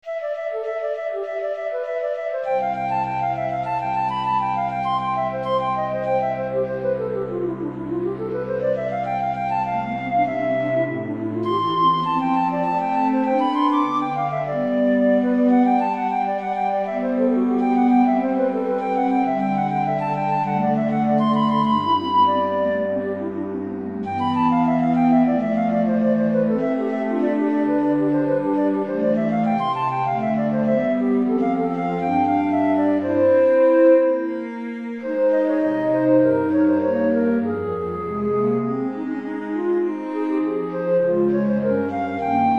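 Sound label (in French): livre d'orgue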